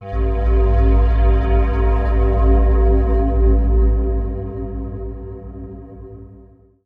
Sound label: TECHNO PAD 140; Keys